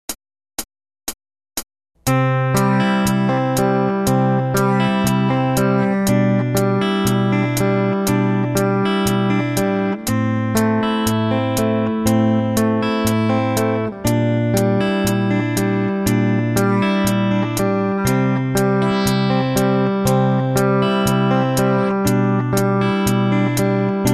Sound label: Rhythm Guitar